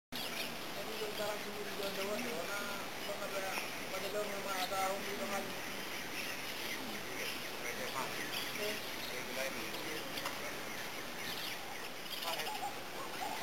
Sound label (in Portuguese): canto da ave do crocodilo